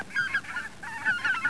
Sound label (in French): oie